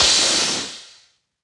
Crash, Dubstep One-Shot Drums

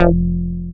Bass Shot F; Mutekki Media; Vengeance Electroshock Vol.2; Bass Shots

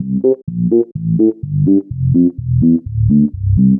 126 C BASS, Funky

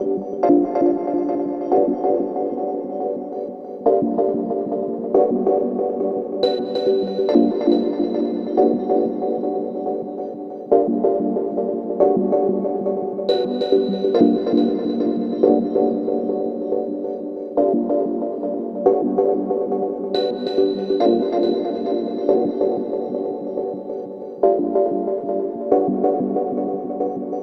Bpm140 Chord Loop; Airy; EarlyMorning; Prime Loops; Dubstep Illusions

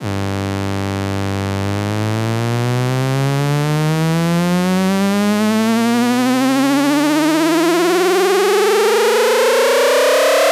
FX Uplifter

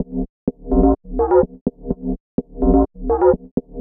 126 D BASS, Funky Underground bass loops; Loopmasters; Funky Underground Vol 2

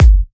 Bassdrum

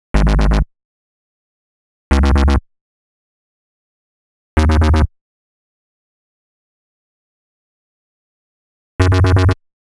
122 D#m Bass, Complex Electro Synths; Prime Loops; Invader

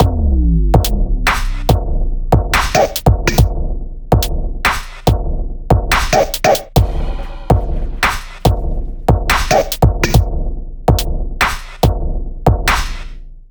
Bpm142 North Pole Break, Dubstep Drum Loops

